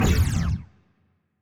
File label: weapon laser muzzle; biogenesis; confuser